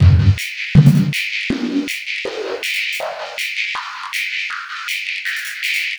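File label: Master Loop, beats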